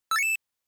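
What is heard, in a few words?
Game Boy General Pitch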